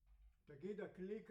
Piano A

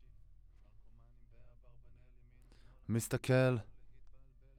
Voc OD

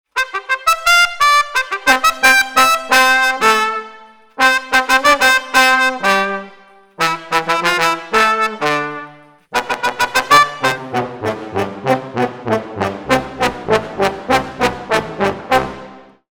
Brass, Jazz for piano

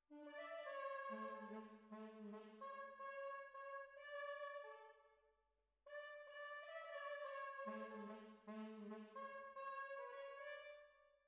Horns

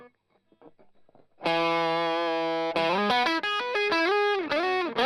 Strum Line

